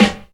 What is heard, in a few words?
damped acoustic snare g sharp key; snare drum